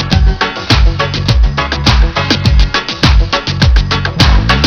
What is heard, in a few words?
music; quake2